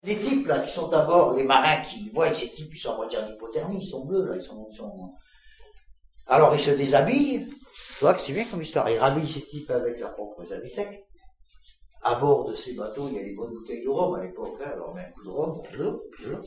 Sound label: DRUM